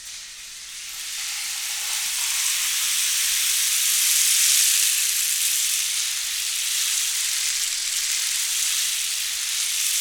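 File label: percussion rain stick; Roland - Rhythm Section; Water Perc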